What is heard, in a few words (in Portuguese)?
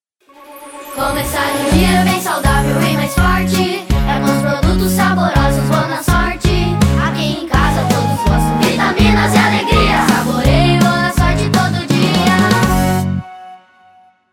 Jingle; Bona Sorte